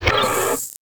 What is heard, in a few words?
war damage; Gramble